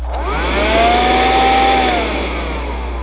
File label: Eraser; SketchBook 2.1